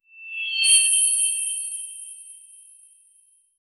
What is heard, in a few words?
magical chime heavy drop; healing